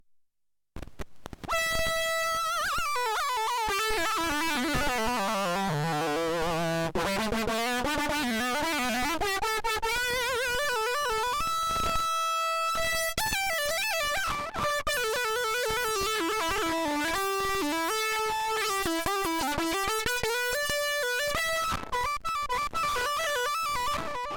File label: Fuzz Moog, played on a MiniMoog; ARIA/DIAMOND FUZZ(IBANEZ STANDARD FUZZ)